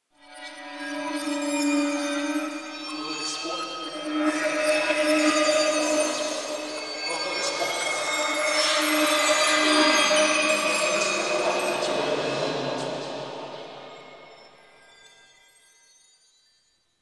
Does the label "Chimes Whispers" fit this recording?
Yes